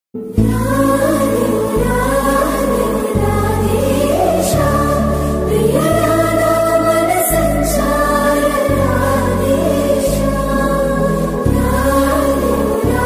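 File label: climax background music ringtone villan beats climax background music ringtone love ringtone climax background music; radhe shyam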